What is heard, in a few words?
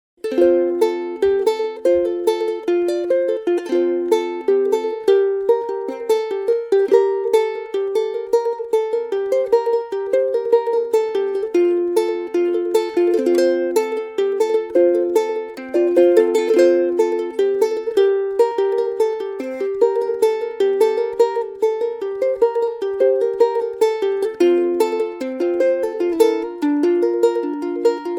uke